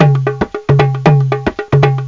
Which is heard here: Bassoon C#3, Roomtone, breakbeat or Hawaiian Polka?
breakbeat